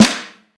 damped snare single hit a sharp key, snare drum sound tuned to the A# note